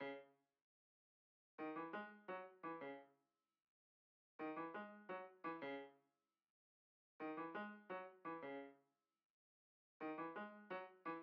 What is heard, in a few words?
C sharp Minor Keys Main piano